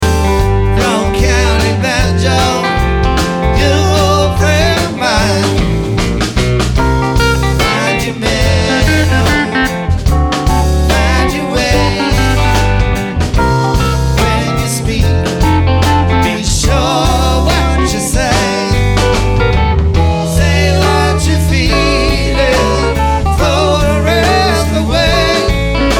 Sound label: Banjo